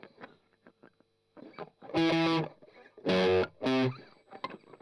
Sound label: Strum Octav